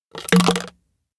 pen into bottle; anemoi

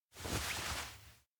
wipe sweat sleeve; anemoi

